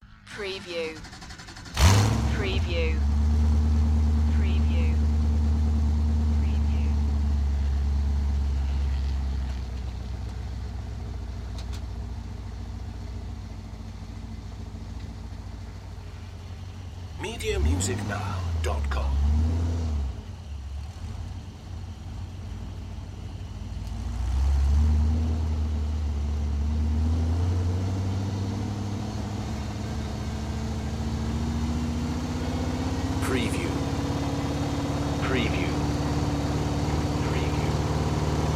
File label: Chevy V8 Hot Rod Long Sound Engine Start Drive Slow Idle